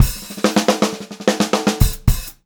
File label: POP FILL, Pop-Rock Drumloops; 108 POP